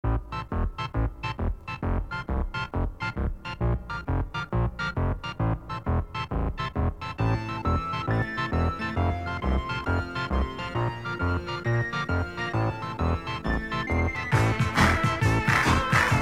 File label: childrens tv theme